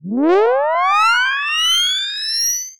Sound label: Science Fiction Sci-Fi Electronic Ascending